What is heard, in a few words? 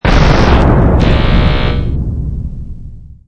explod